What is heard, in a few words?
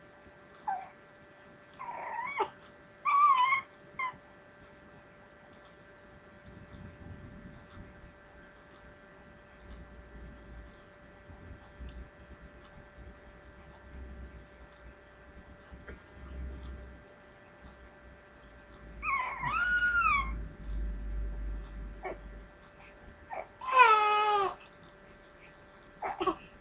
crying